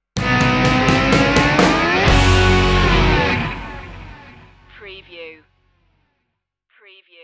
hot wheels sting

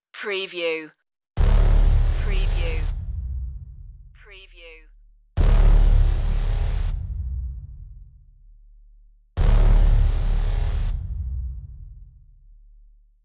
hybrid trailer blares only

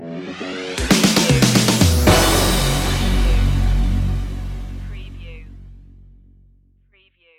boots of fire stinger

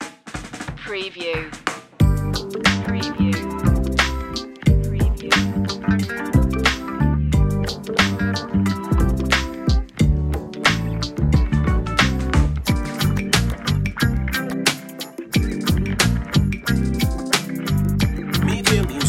funky sweet relax

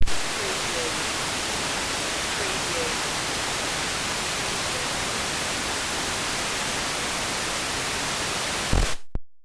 TV VHF Snow; Quasar

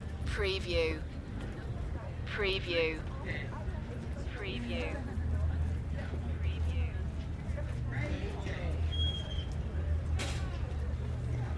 College Campus Ambience Long

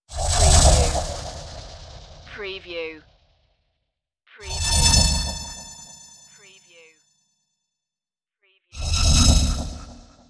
starship slowdown slides

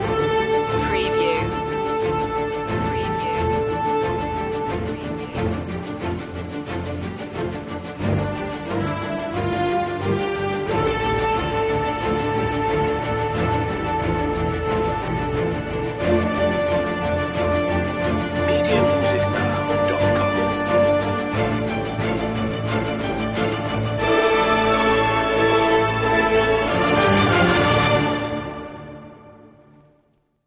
stormbloods no choir no perc

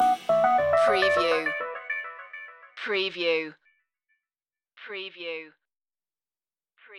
up your street loop end

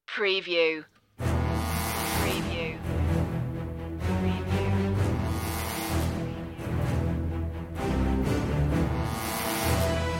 strings brass

